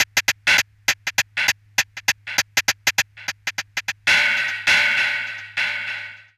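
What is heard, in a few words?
MINX hi-hat, drum loop; Big Fish Audio; Uncivilised G'rooves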